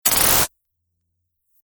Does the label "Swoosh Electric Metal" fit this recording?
Yes